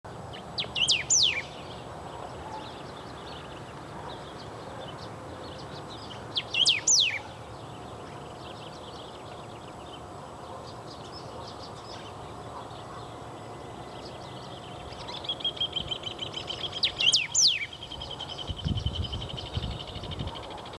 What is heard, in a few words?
Pepitero de Collar, Saltator aurantiirostris, Golden-billed Saltator; Emberizidae; cantos